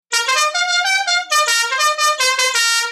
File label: Oh Susanna Musical Car Horn